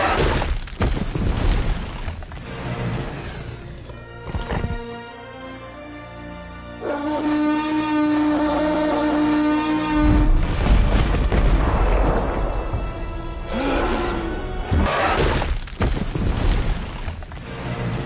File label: roar; طنز غرش تیرکس